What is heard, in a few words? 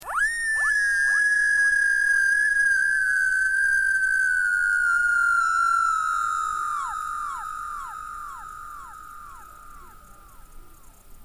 screams